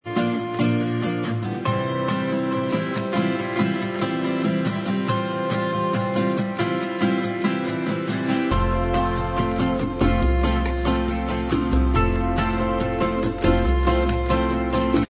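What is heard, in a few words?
bossa style instrumental track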